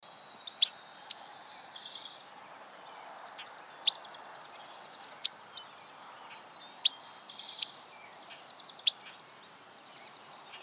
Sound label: Dickcissel, Spiza americana; cardinals tanagers grosbeaks